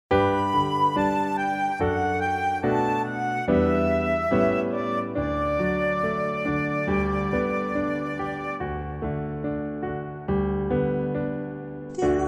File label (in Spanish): Dios te acompañe, Canta